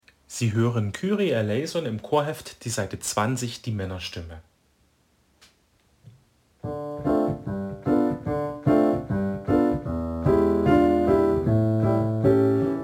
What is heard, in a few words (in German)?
Kyrie Maennerstimme